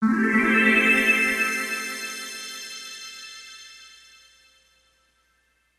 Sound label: Common Flourish; Headlander